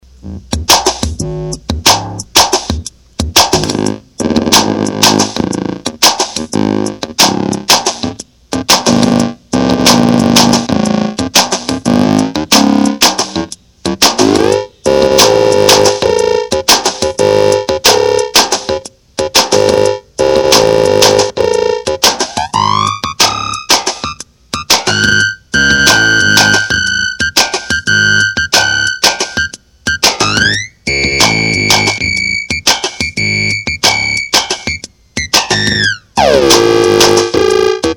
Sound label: antenna drum